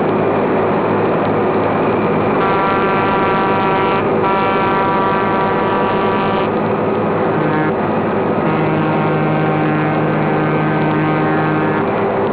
truck passes; duel

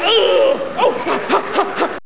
ooh hahahaha

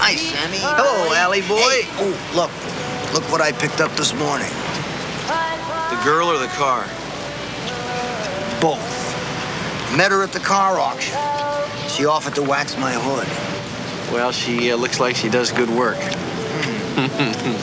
car; Dean Stockwell

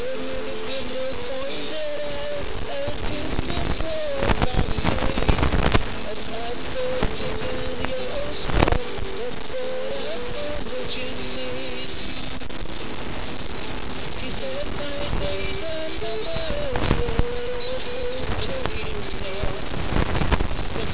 bird

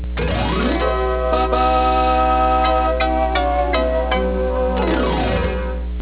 fanfare

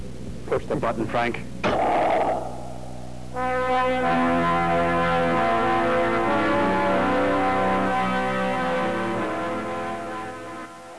button

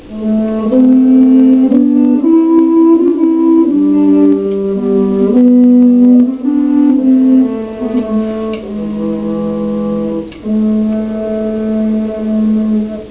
recorder great basses

